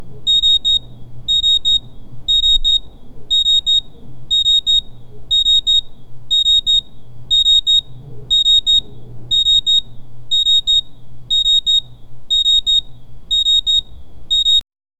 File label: ticking clock crickets